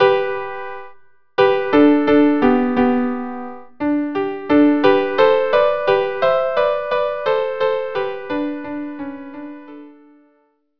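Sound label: Larghetto violin viola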